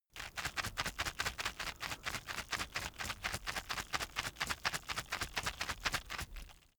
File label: plastic drug blister shake soft